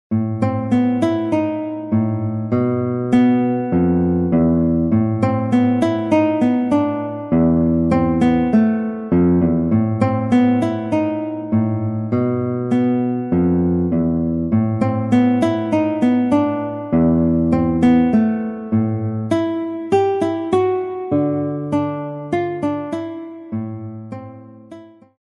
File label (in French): Petite guitare chanson frisson